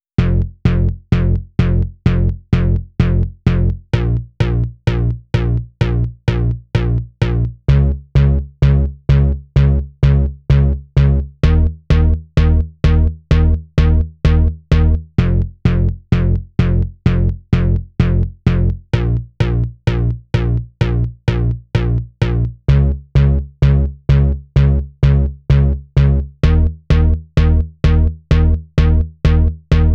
NuTrance Kit Bass; Mutekki Media; Vengeance Electroshock Vol.2

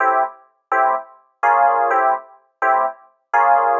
126 A Bb music, Funky Underground; Loopmasters; Funky Underground Vol 2

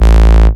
Bass Shot A; Mutekki Media; Vengeance Electroshock Vol.2; Bass Shots

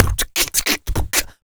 Human Beat Box, Drum & Bass; Loopmasters

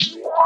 E stab; Loopmasters; Funky Underground Vol 2

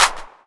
Claps & Snares

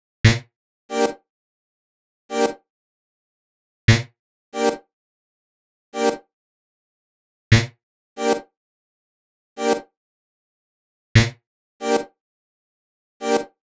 132 Em Synth, Complex Electro Synths; Prime Loops; Ossify

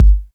KICK, DRUM HITS

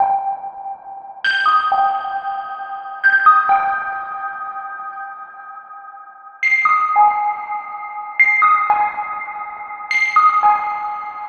Bpm138 Synth Loop; Airy; Clear; CmG#maj; Prime Loops; Dubstep Illusions

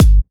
Bassdrum

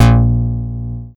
Bass Shot A#

